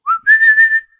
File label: dangle whistle